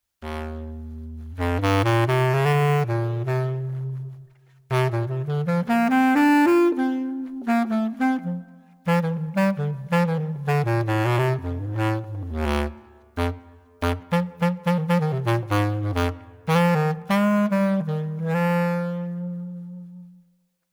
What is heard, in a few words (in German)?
pickup bassklarinette; Einbau-Tonabnehmer für Holzblasinstrumente